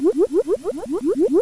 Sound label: DIPPING